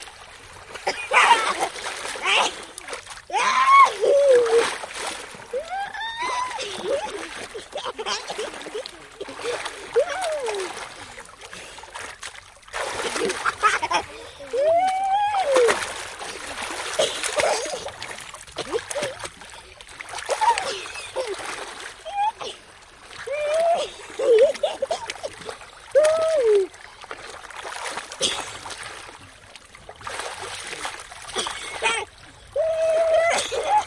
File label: Kid Venezuelan Play, kids play